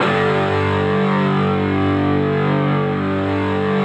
H METAL

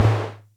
Music Percussion Computer Tom, Drum Machines

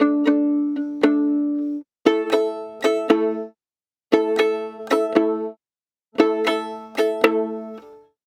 Arabic Violin; Sonic Foundry (Sony Creative Software); Continental Drift Aftershocks

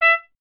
TP E; Miroslav Vitous; Solo Instruments VOL-1

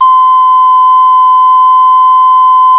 Clay Flute; Roland LCDP11 Africa VOL-1; Afro Pipes

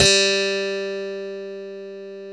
HARPSIC G3

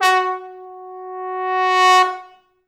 F#3, Pop Brass; Best Service ProSamples vol.19; AKAI

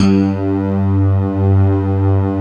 STACK

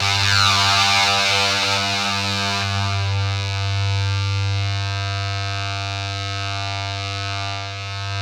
ZWEEP, Synthy Tonal; Distored Reality

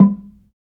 Log Drums, DRY; Roland LCDP11 Africa VOL-1; Wood & Metal

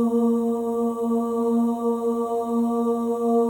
OH, FEM, Symphony of Voices

